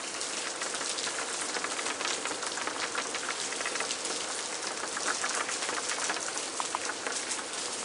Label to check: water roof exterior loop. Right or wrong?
Right